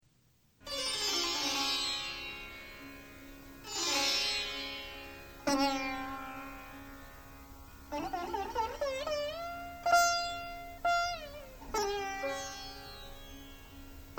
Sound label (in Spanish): Musica Etnica Hindi Classical india